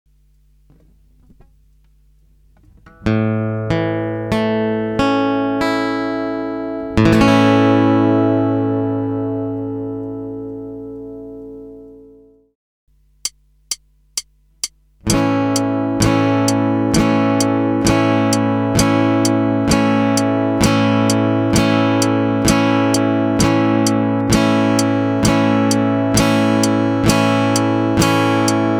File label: a major, guitar